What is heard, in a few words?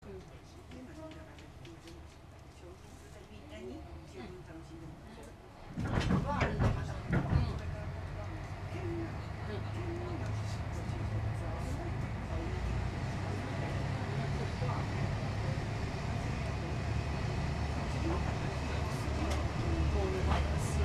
tsugaru stove train